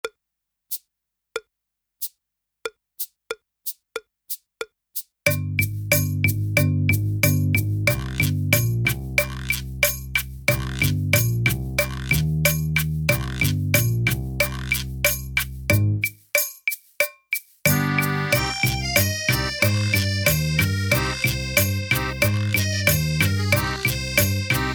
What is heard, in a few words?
backing, drumming; Creative Drumming Made Simple